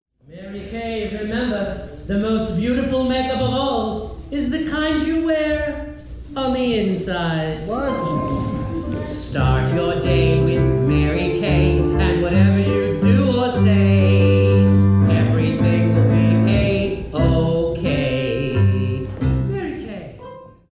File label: Jingle